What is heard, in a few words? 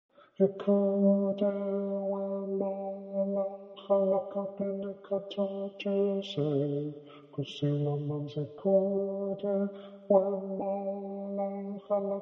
Bass